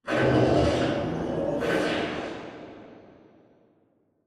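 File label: Robot Arm Left Deliver Retract Distant; Boomtown; Titanfall 2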